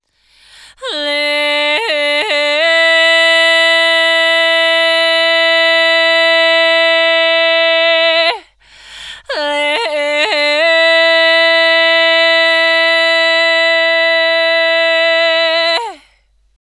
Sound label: Hey Vocals - Fm 60Bpm; Construction Kits; Ghosthack; SkyRealm; Kit 04 - High Rock View